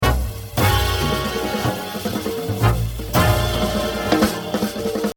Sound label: Exercise, jazz drumming